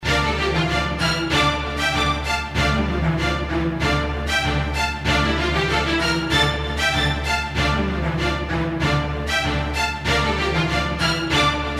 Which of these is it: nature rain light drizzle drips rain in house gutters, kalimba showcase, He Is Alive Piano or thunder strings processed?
thunder strings processed